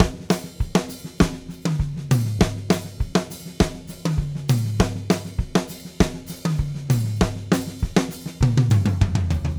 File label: Drumset Solo; Sonic Foundry (Sony Creative Software); Joe Vitale Latin Percussion; Solos